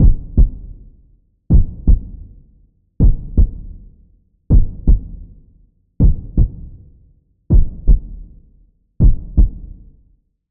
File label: SFX - Heartbeat; Routed Sound; Vital Expansion - Quantum Enforcer Vol.1